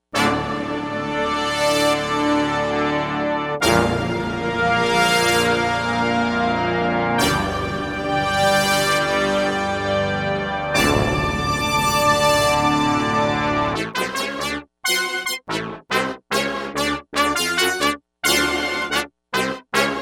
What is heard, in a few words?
Big Brass; Digital Synthesizer